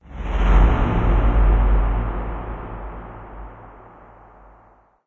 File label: amb random abstract; video games; ambient; source engine; portal2